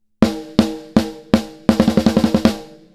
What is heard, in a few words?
Snare bypass